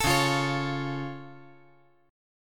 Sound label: C sharp Augmented down, chord; strummed; JGuitar